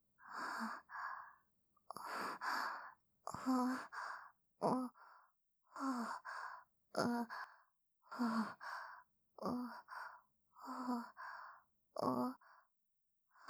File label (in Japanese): 吐息